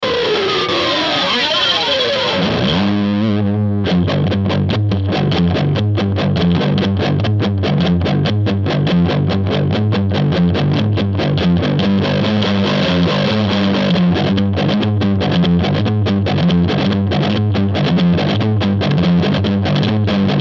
Ibanez RGD71AL Zerre Middle

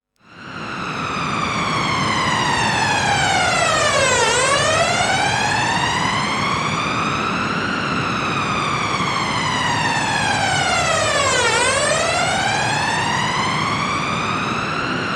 Teisco S100P WAVE mit Flanger

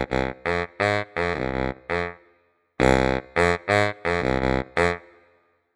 Yamaha YDS-150 Bariton

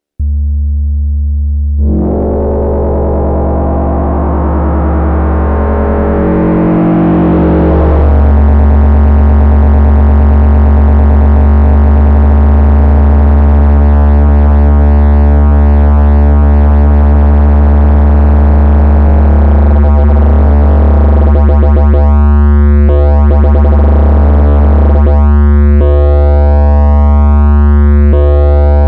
Wellenform Fold mit Modulation

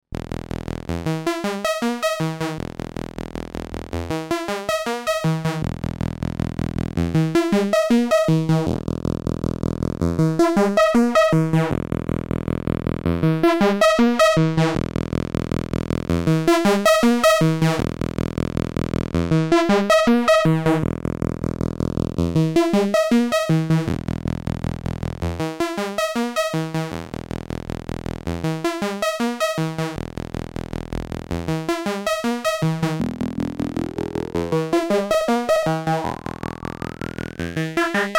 Lamda Notch mit Fehler

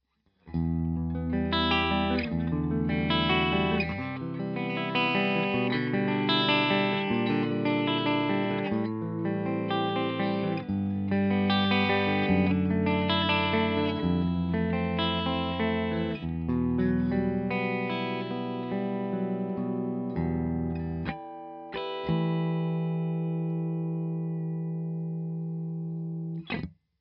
Beide PUs Clean

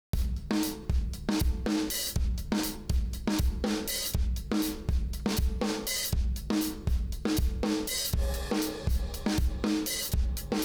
Drums Komp only

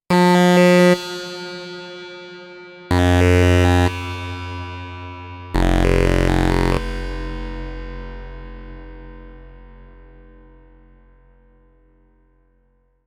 Korg KROSS 2 Reverb Fahne

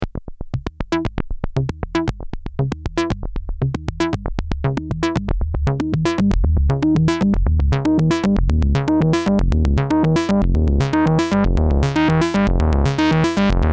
sequenz Hüllkurven ADSR Check

